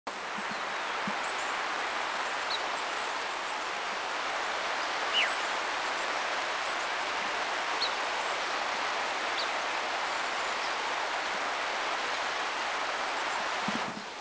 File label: Yellow-bellied Tit; China; Stijn De Win; Birding2asia